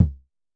Drum X Kick, Pearl Drum-X

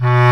BASS CLAR B1, WIND

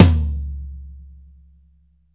DRY FLOOR, Drumworks; Sampleheads - New York City Drumworks VOL-1

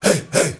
HEY HEY

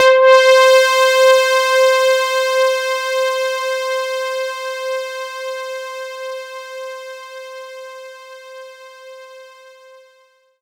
C5, Synth Line; 90 s Sample CDs; NiceBeats; SynthLine VOL-31

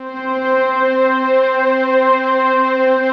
rich mellow, Mellow & Rich; Optical Media International; Sonic Images Library

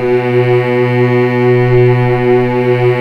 B2 MF, The Ultimate String Collection; East West

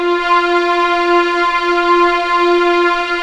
F4 EXP MF, VIOLINS; East West; The Ultimate String Collection

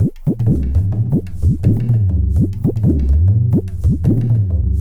tabla, 100 bpm; Pure Tabla; Zero-G

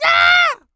A YELL, MALE HUMAN; ILIO; Vocal Planet

